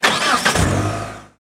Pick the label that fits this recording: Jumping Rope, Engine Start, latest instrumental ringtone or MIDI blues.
Engine Start